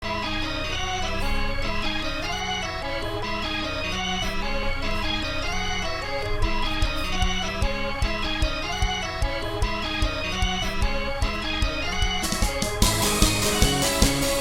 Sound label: instrumental